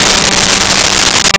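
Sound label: Steel String Guitar G4; Nintendo; July 2020 Gigaleak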